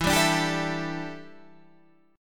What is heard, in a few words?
E Minor 11th E down Guitar Standard; Em11 strummed; JGuitar